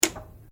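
Switch Oscilloscope Flip Down, Mechanical switches; Electrical Buttons; Sound Effects:Multimedia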